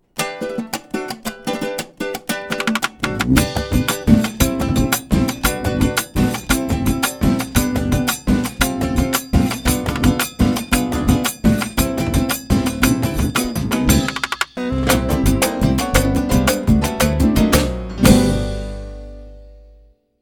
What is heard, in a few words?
Gaita; Vtelca; V2800